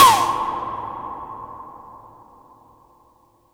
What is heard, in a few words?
TOM DIS REV, ELEC DRUM; USB Soundscan vol.20; Fresh Disco House I; AKAI